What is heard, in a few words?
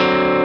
x x 4 3 4 C#7